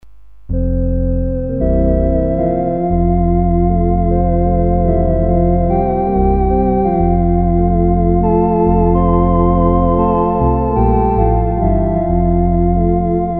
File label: Once in Royal Davids City, Organ